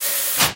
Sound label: Psychofluid Pump Working Start; rimworld